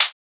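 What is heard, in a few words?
closed hihat; HiHats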